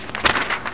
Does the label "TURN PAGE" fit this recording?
Yes